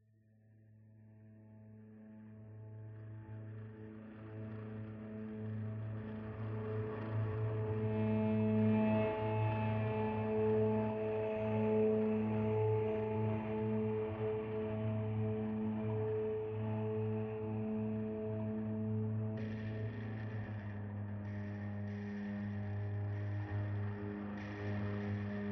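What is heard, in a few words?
Audio Drone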